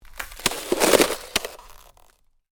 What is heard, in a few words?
Ice: short crack of ice under pressure; Snow; Weather; Stuart Duffield